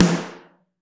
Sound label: SN2 Mono, Snare; Ableton Foundation; Snare Designer